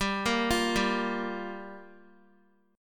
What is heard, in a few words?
G Minor 7th, Guitar; Gm7; arpeggiated; x,10,8,10,7,x